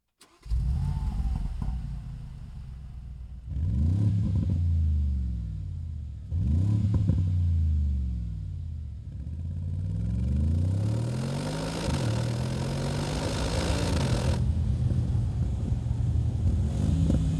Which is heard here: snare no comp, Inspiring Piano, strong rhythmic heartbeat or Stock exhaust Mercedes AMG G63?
Stock exhaust Mercedes AMG G63